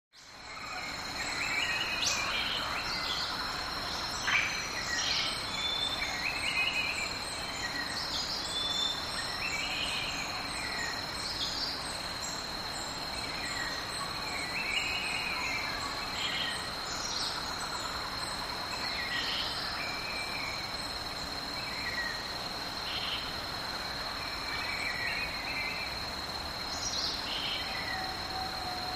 Jungle Ambience With Medium Perspective Bird Chirps, Distant Insects And Light Wind. Close Perspective Insect Fly Bys.; backgrounds